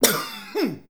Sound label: cqugh, shouts; Best Service; Hyper Dance